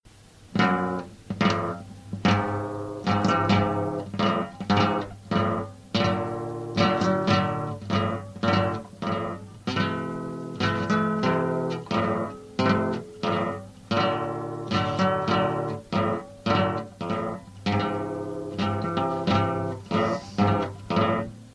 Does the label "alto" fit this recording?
No